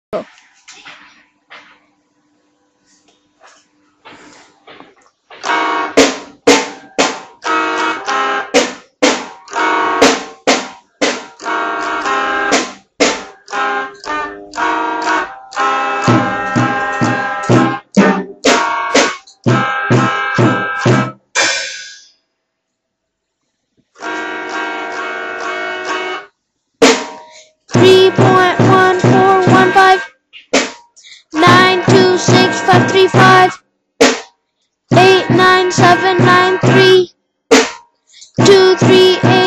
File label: Beats; PiDay